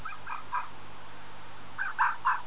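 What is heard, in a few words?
kit, fox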